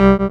Synth Sound; Vengeance Samples; VEE Synth Shots

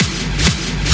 Fill Ins 128BPM; Vengeance Samples

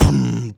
Beatbox Kick, Human Beatbox

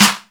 snares; Vengeance Samples

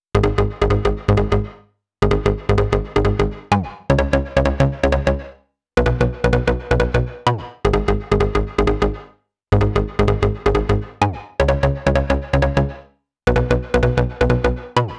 Bass, 128 BPM; Rap; Vengeance Samples; Vocal-Hook Kits; Song Kit; Let's Move

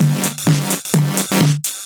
VFX2 Loop 128 BPM

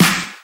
Snares; Vengeance Samples

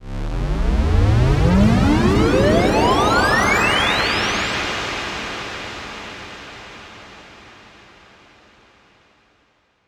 Uplifter

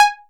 Synth Cuts; Vengeance Samples; VES1 Instruments

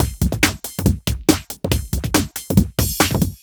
Loops BB 140, BreakBeats; Vengeance Samples

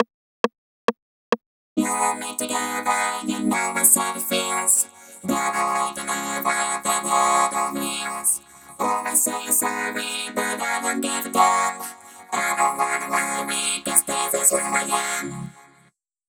Chorus VOCODER FX, 136 BPM; Vengeance Samples; Head Over Heals

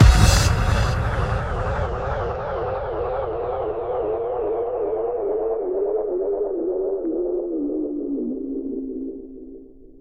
Hallkick; Vengeance Samples; VEH1 FX

